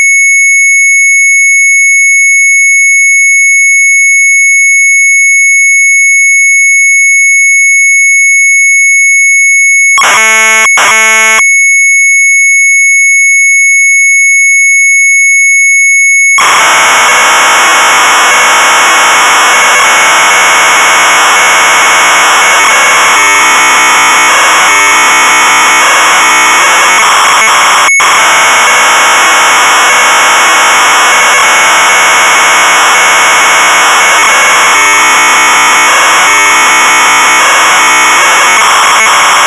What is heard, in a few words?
MZ800 Tape OPENING DATA; Sharp